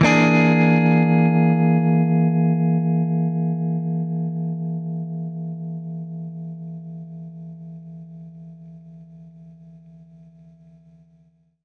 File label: Baritone Chord E7b5, Guitar Chords; undercover samples